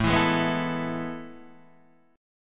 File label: A Major 7th Suspended 2nd, chord